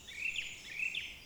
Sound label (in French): 2x Trille, Oiseaux